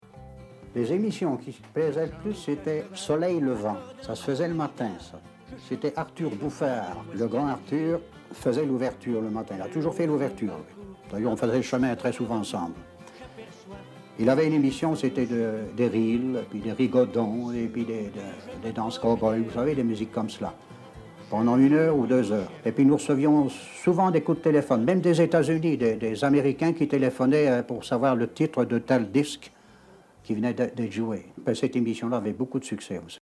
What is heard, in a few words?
western music